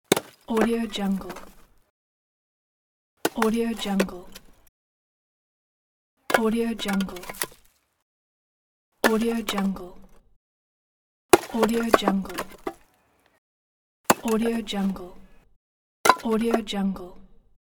throw a stone on a stone

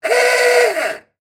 klaxon horn; sound ideas

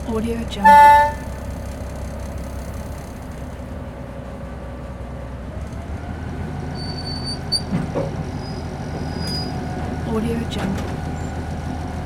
freight train

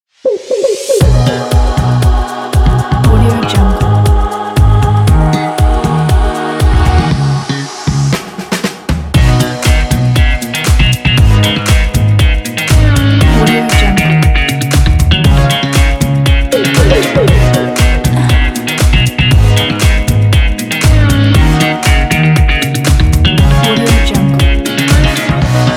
the funk pop

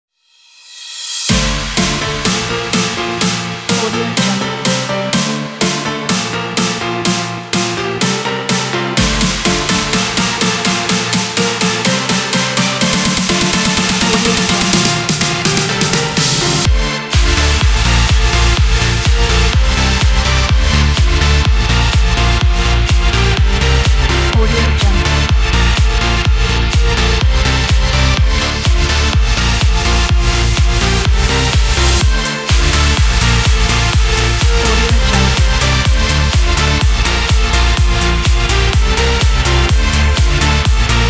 summer energy dance

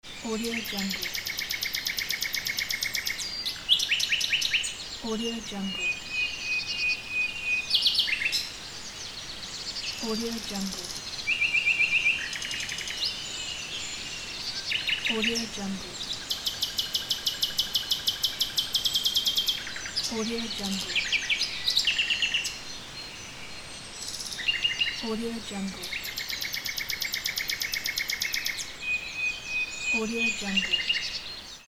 the birds

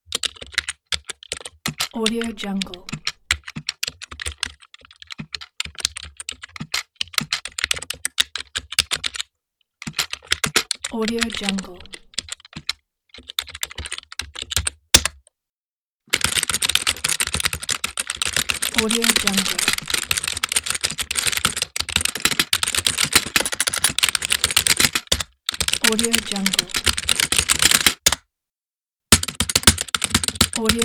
old keyboard typing

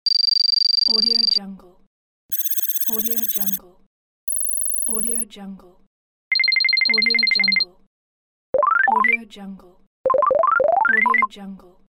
digital text; tibasfx

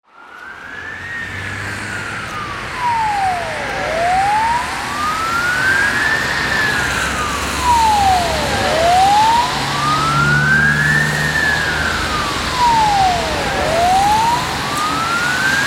ambulance

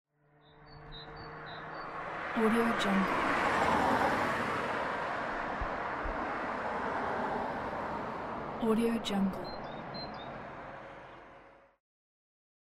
city traffic and birds singing

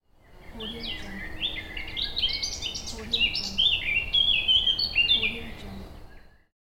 forest ambience

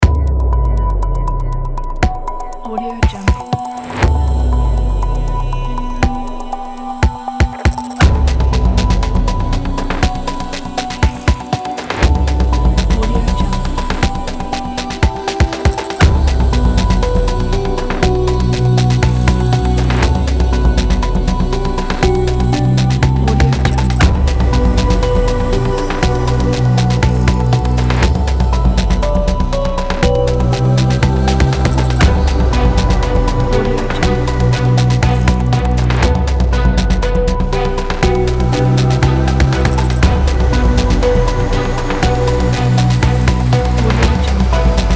hollywood trailer

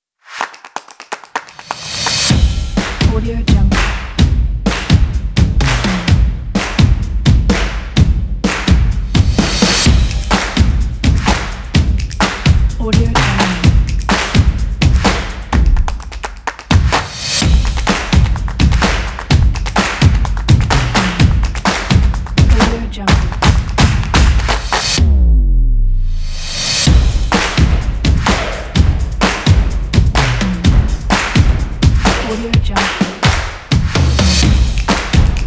drums

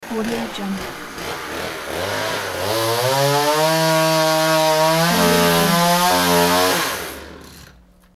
chainsaw rev to cut off

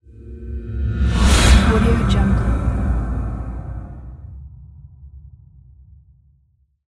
horror whoosh piano revers; archisound

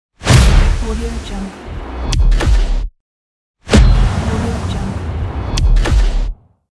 slow mo effect

